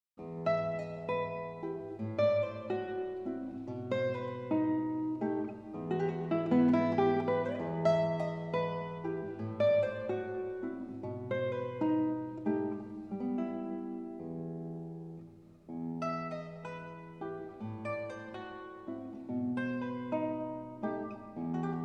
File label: Guitar, Mazurka; Francisco Tarrega, Adelita